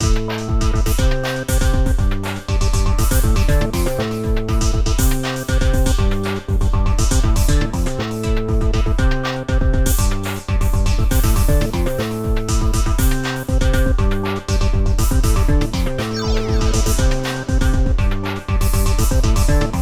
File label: Retro Gaming Loop